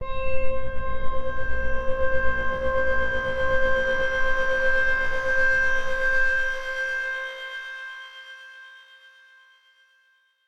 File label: gleba, ambient; Factorio - Space Age